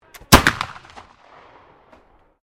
Musket Flintlock 55 Cal Firing, Black Powder Muskets; Gun Shot; Hawkins